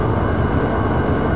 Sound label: Visit big Fanjet; A-10 Attack! Cuba Demo